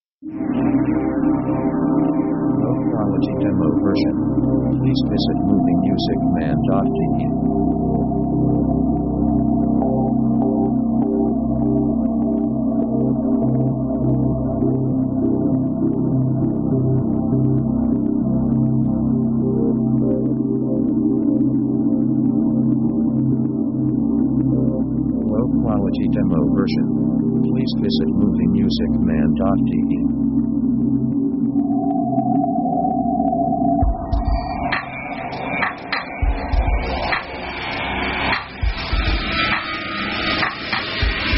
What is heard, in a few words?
mysterious; synth themes